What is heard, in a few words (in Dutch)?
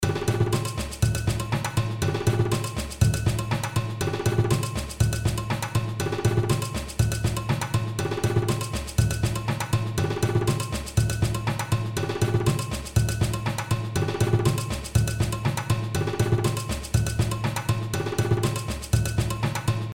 ritme